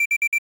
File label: System Gauge; Rei Yumesaki